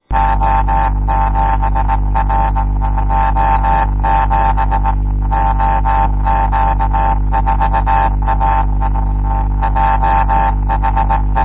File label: cw slow